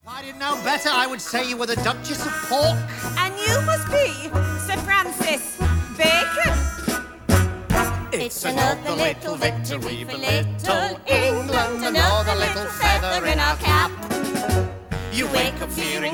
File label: GUIDE VOCAL